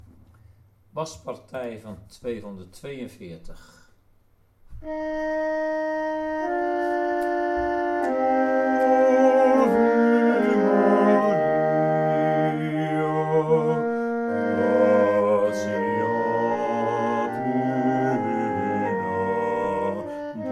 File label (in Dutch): bas; koor; muziek-instuderen